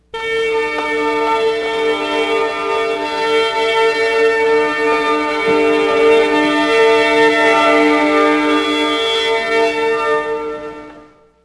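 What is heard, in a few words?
orchestra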